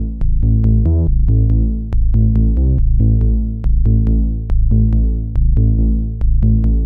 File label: TECHNO BASS 140 A